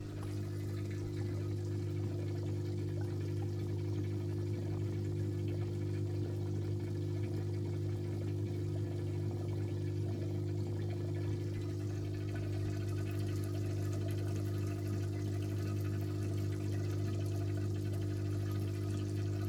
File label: Fishtank Pump Water Tank Bubbling Drone